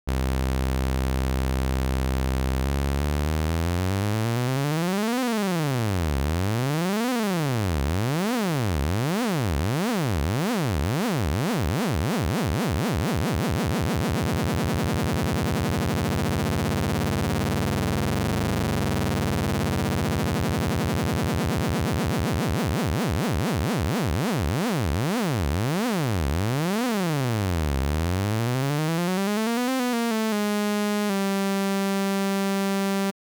LFO rate de 0 à 20 Hz puis retour à 0 Hz variation du pitch

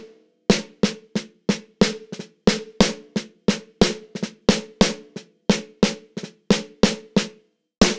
Caisse claire

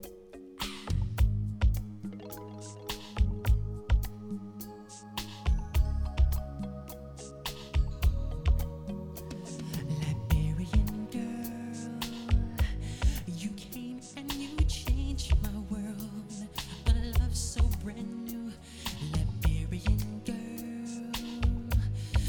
Musique à bas volume avec boost des graves et aigues

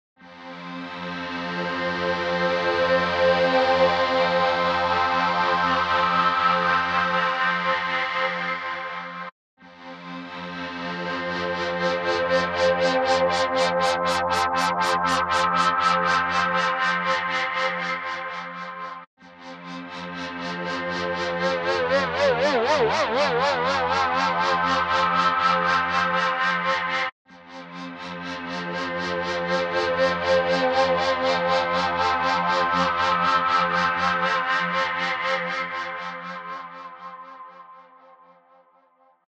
Synth line avec plusieurs LFO

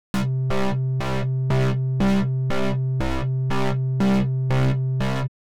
Ligne de synthé avec effet de Sidechain

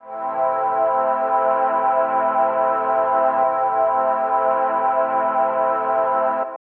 Son centré sur 1000 Hz

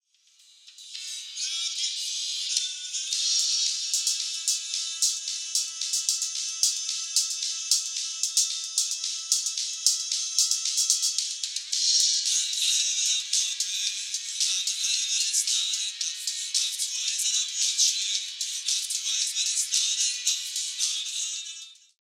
tampon 4 kHz 8 kHz

